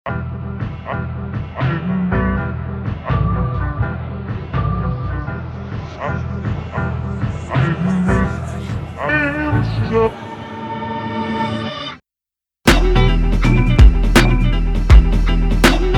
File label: Instrumental; hip hop type beat